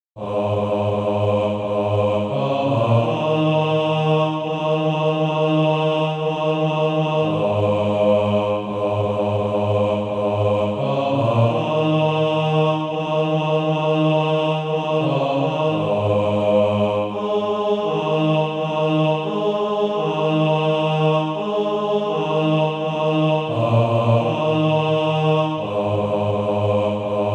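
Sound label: bass, SATB Practise Music; Easter Offerings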